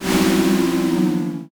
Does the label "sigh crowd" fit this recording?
Yes